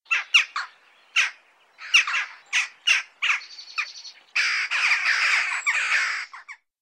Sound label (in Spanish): grajilla